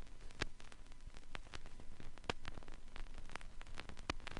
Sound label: crackles, Vinyl